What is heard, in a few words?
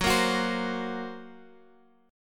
F sharp Major Flat 5th F sharp, Guitar Open F; strummed; 18,17,18,19,x,x